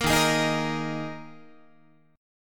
Eb Suspended 4th, Guitar; Ebsus4 strummed; JGuitar

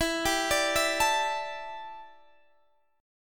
D Suspended 2nd Suspended 4th E

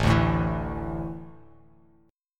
A Suspended 4th, Bass Standard; Asus4 strummed; JGuitar

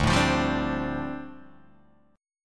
D Minor 11th D down Guitar Open D

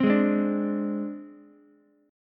C Suspended 2nd Sharp 5th, C, Ukulele; strummed; 5,8,8,5; JGuitar

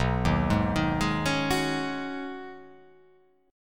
B 6th Add 9th B down, chord

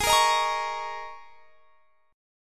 Ab Suspended 2nd Suspended 4th; strummed; Absus2sus4; x,23,20,20,23,21

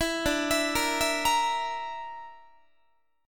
D Suspended 2nd Sharp 5th D, Guitar; Dsus2#5; arpeggiated; JGuitar

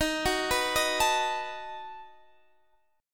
B 7th Eb

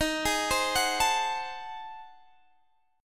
G sharp 7th Flat 9th D sharp, chord

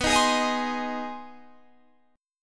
Ab Major B; strummed; Ab/B; JGuitar

